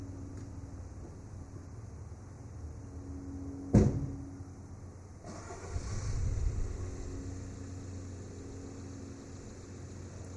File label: plane flies over head as car also drives off